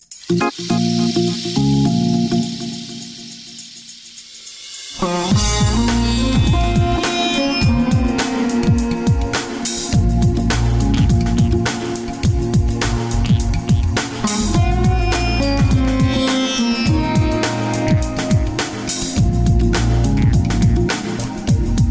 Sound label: indian background music